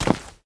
step; smite; chronos

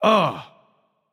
surprised; smite; raijin

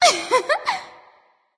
laugh; smite; awilix

